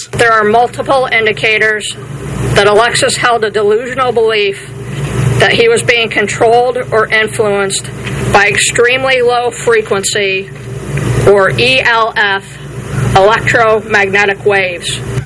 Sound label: Electro Magnetic Waves